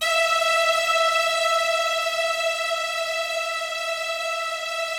BIG ORCHESTR, E4; Yamaha VP-1; Music Store; 90's Sample CDs

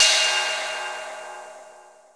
SDS RIDE, Drums, CYMBAL; Best Service; Real Mega Drums VOL-1

